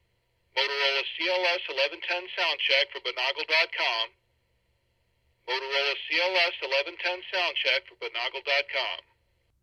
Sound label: motorola cls1110, radio samples